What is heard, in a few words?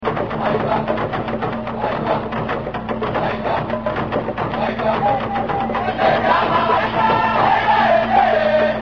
African Music